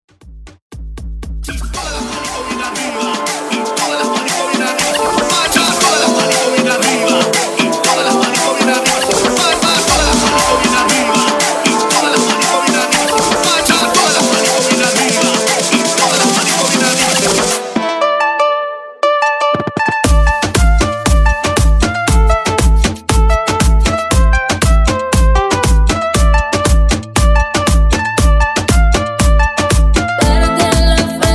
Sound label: top40 moombahton intro; 118 BPM